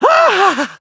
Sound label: ahah